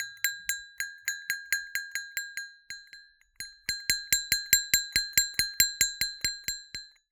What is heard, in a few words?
Cowbell Chain, Percussion